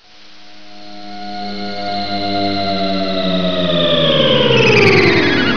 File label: spaceship